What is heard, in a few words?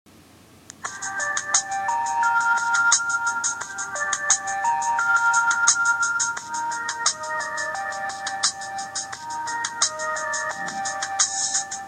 ringtone